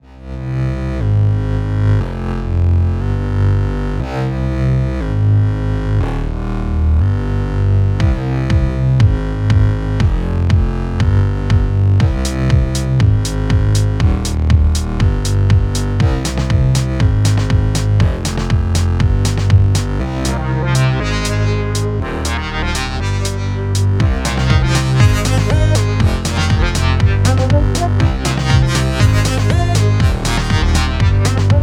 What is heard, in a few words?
funky thing